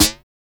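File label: QUIRKY snare; Drums In Your FACE!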